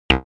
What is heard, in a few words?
synthlead; pulsar